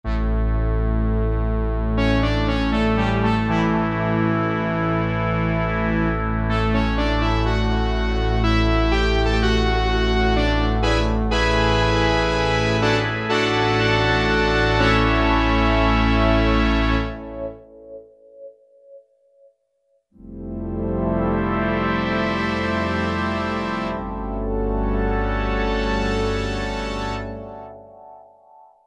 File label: Polysynth; Greytsounds Philip Wolfe's Rock Keyboards